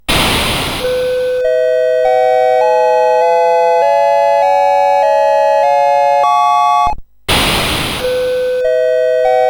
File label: BASIC sid CSG8580R5